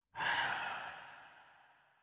灵魂疾行声

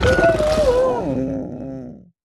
嗅探兽死亡声

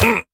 受伤声; 卫道士

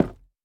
錾制书架破坏声

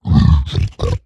疣猪兽待机声

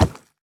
猪灵脚步声

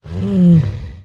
幼年北极熊待机声

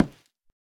下界木破坏声

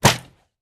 弩射击声

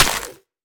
沾泥的 红树根 破坏声

